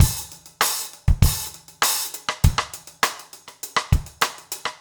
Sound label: basic funk rims